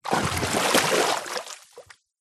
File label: nature water movement splash from underwater to surface